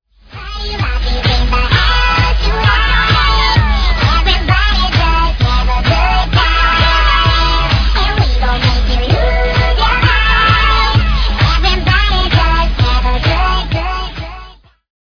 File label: Chipmunks; Chipmunks Chipettes